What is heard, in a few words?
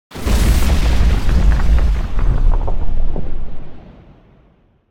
Explosion Low Fire Destruction - Light Glass And Pipe; Hollywood Edge; sound designer's toolkit v2